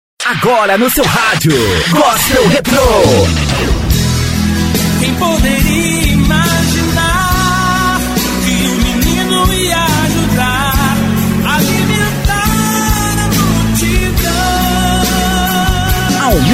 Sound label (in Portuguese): Gospel Retro